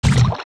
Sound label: Fall Down Shallow Water; Link; Zelda; OOT